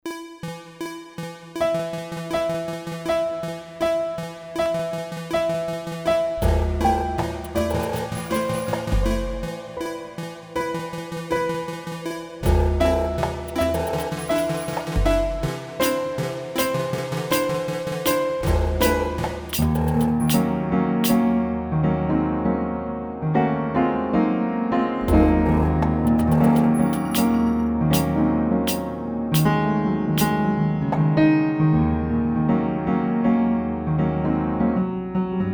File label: Iron piano collar